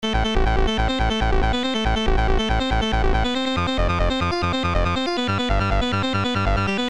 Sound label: dirty lead